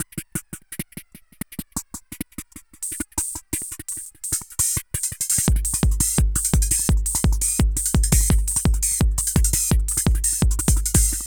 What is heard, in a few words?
ECHO, Tekkno LOOPS 170; ECHO PARK; Masterbits - Tekkno Trax